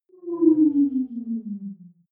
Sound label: wobble drop high